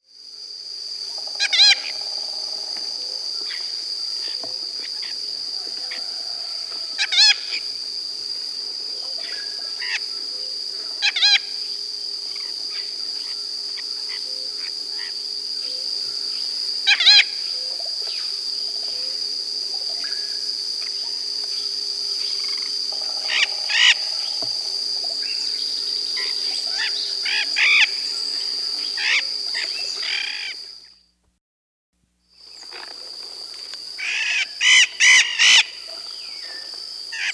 Blue-headed Macaw, Ara couloni, Nature's Songs, S. America